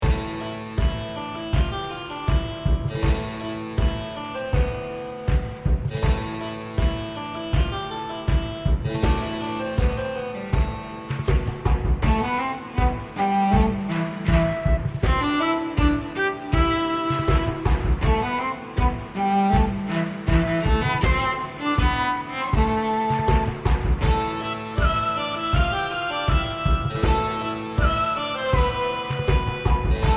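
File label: Sword In Stone, royalty-free music; Make it Cinema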